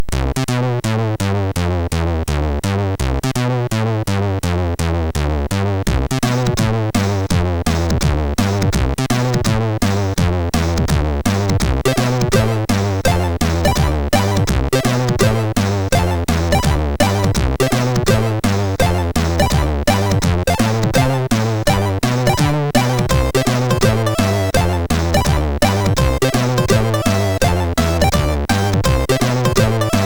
Big Band, MOS6581R3; hvsc

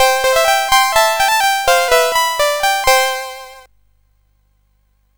sid, CSG8580R5

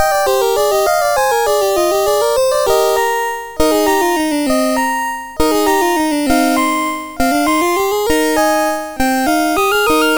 Keyboard Piece E Flat, CSG8580R5

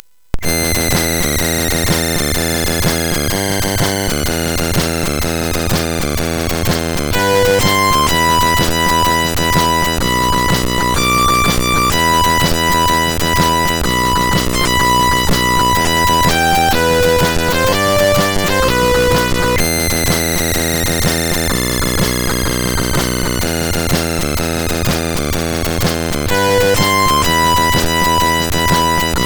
sid MOS6581R4, GAMES; Dalek Attack

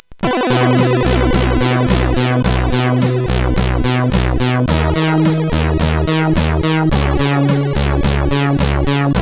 sid CSG8580R5, GAMES; Dark Caves

